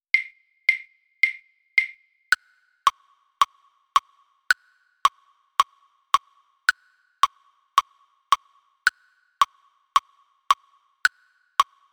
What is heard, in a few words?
cha cha click